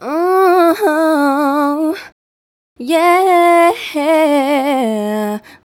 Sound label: Female Vocal; Sonic Foundry (Sony Creative Software); Mac Money